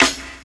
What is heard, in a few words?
clap single shot c sharp key; single hits one shots